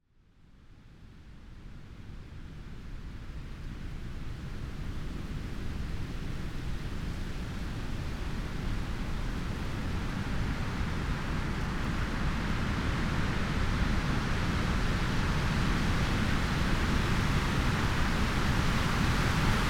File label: Autumn Nightwind; Wind Songs